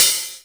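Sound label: open hi hat; Greytsounds; Scott Peers Sound Engineering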